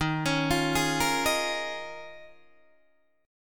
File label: D sharp 9th Suspended 4th D sharp, Guitar Open D